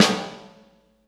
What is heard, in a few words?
SD, 180 BPM, Drum & Bass; Ueberschall; Drum & Bass Flash Trackz